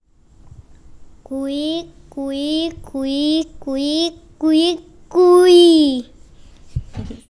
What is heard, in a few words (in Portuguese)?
arremedando o seis-meses; Pia o pio… Os pássaros e as árvores que fazem parte da vida